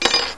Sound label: disk head rattle